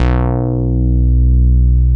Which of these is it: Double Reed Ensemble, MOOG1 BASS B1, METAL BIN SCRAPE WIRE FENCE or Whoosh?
MOOG1 BASS B1